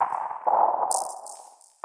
130 atmospheric beat, DRUM LOOPS and BREAKS

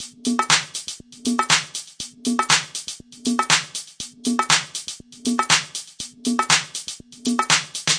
my conga luvs drum machines, 120 bpm

